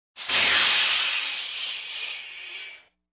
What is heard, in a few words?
Звуки пара Sounds of steam